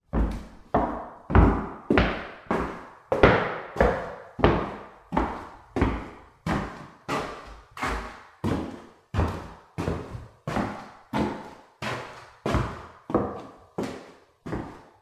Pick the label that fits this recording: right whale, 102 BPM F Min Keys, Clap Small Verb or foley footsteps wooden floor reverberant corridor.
foley footsteps wooden floor reverberant corridor